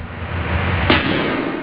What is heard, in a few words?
reverse